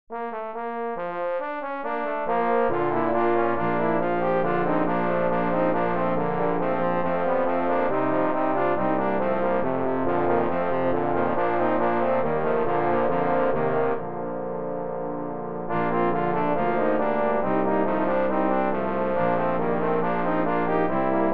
quatuor pour trombone

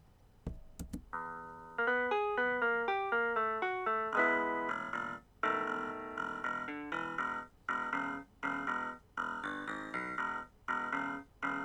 blues

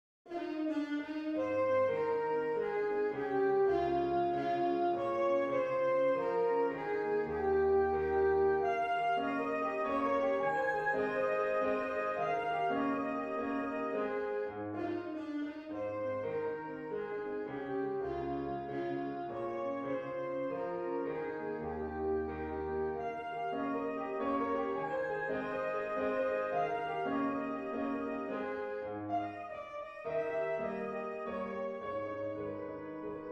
valse; beethoven ludwig van

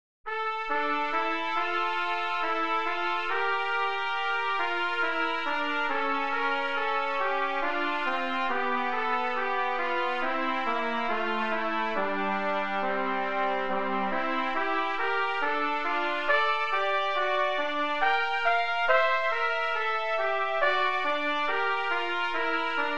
duo pour trompettes

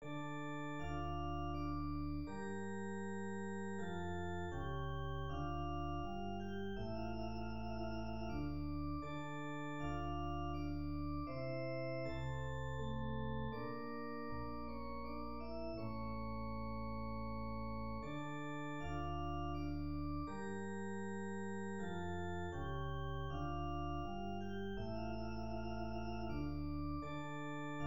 sarabande mineur

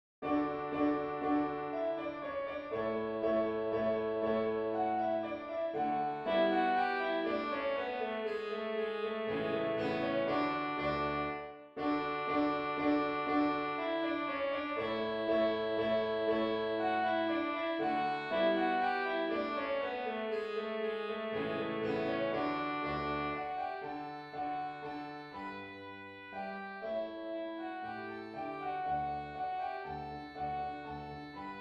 danse allemande, ré majeur; haydn joseph

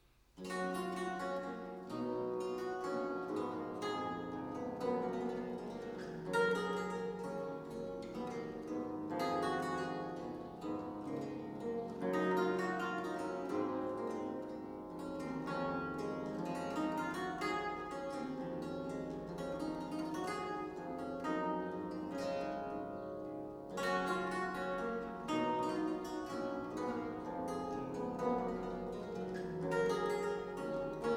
menuet; Ernst Gottlieb Baron

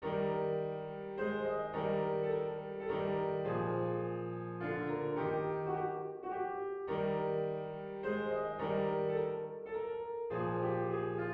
danse allemande mib majeur; haydn joseph

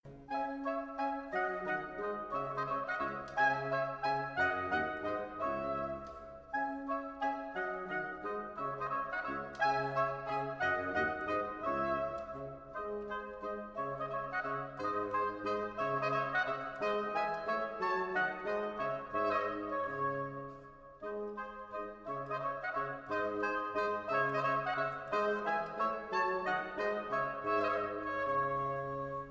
menuets; lully jean baptiste

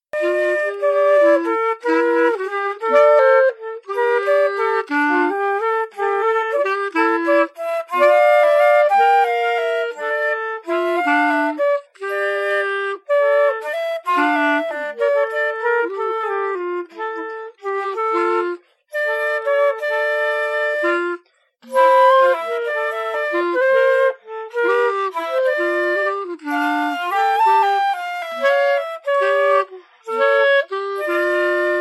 duo pour clarinette

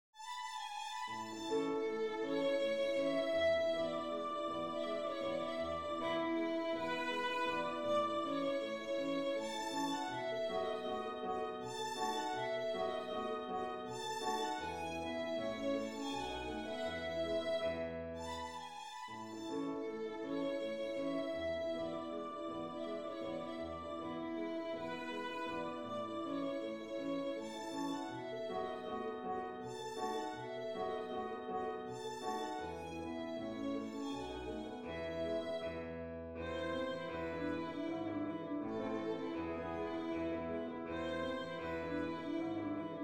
menuet quintette cordes; boccherini luigi

